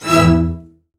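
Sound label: String Section; CMI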